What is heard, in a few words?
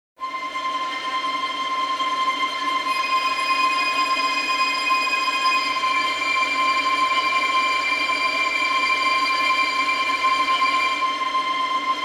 Orchestra